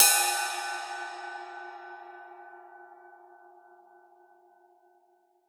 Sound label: Ride, Cymbals